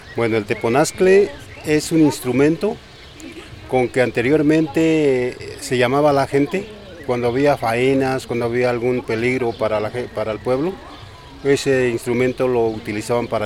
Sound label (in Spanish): Teponaztle; San Juan Atzingo